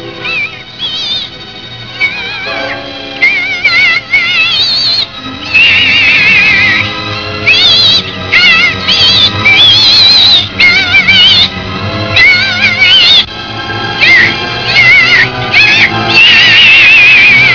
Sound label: fly